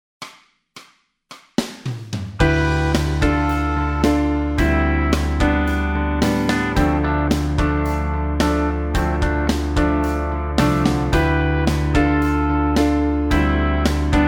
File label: guitar scales, backing tracks